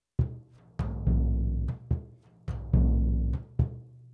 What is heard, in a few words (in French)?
Surdo de marcation rythme de base; Sudo 1